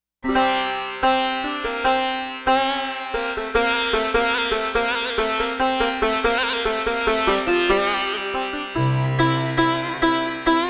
sitar on c; JUNO-Di Patch Demo

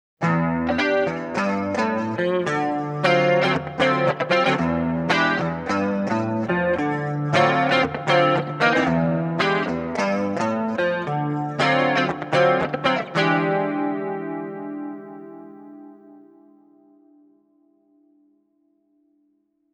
chorus; cube 60 jc

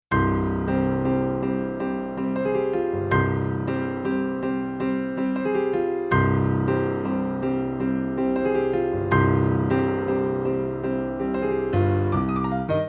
concert grand; RD-800

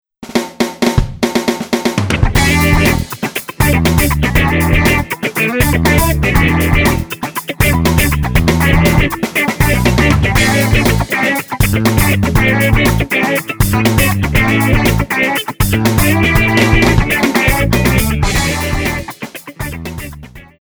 patch demo

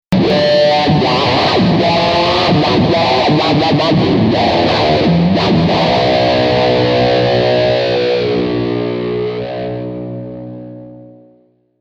wah; pw 10 mowah 2

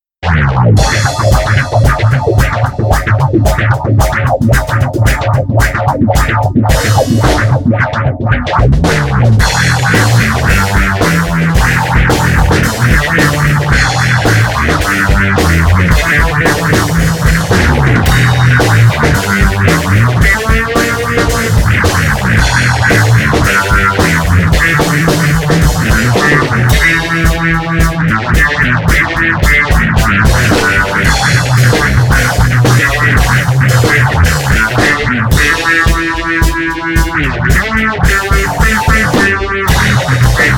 me-20b patch; ME-20B Bank 5, No. 2; Psychedelic sound combining distortion, phaser, and wah.

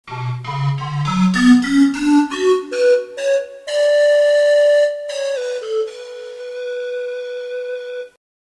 pan pipes

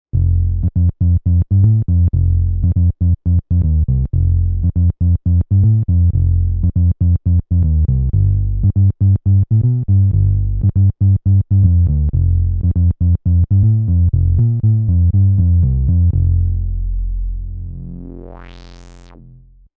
Sold Bass

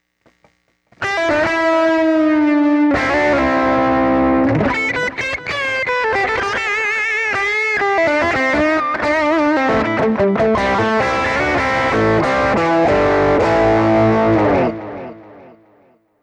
brit delay; cube 60

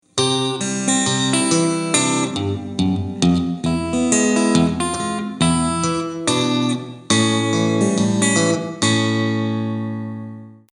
acoustic; cube 30

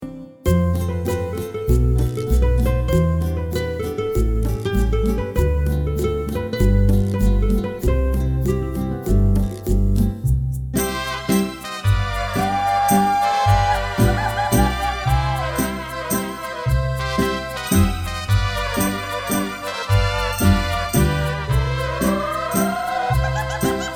latin styles

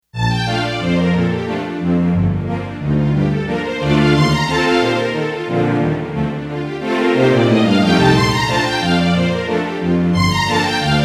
strings

